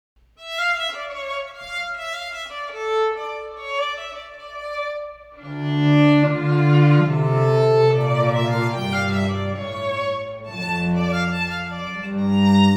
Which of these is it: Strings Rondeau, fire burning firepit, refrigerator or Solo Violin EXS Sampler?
Strings Rondeau